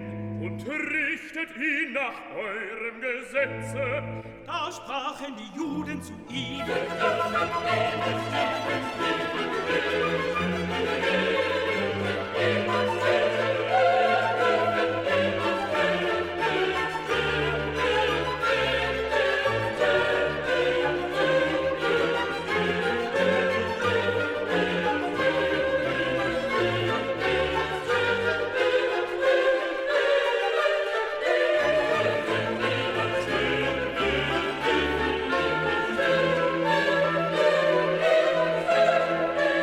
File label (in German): SOPRAN, Stimmen mit Klavier; Johannes Passion